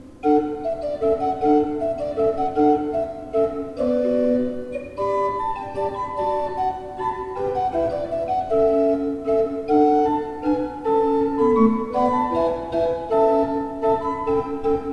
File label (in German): Vermeulen 5 I P, orgel